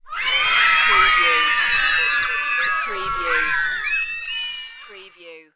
Children Screaming

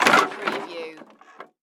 Crash Wood Drop 2x4

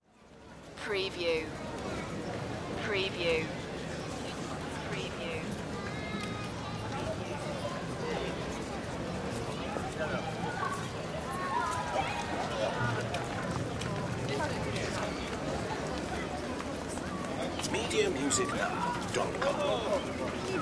Crowd, City Ambience Pedestrian Area

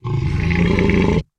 Monster Grunt

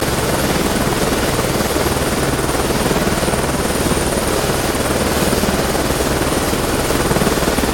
Helicopter Close Idle Loop

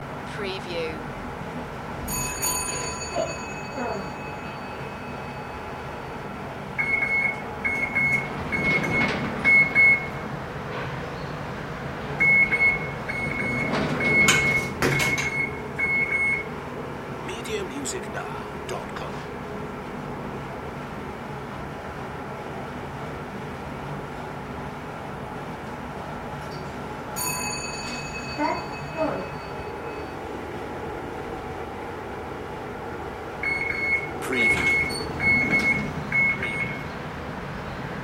elevator ride loop

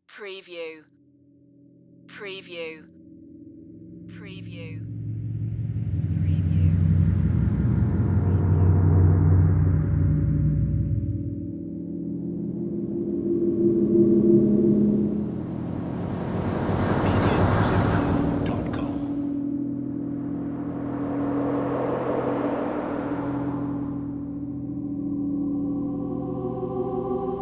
Bed Ghostly

